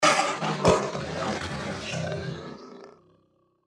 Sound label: deathclaw chase; npc